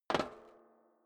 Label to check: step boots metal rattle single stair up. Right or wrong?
Right